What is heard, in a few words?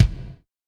kicks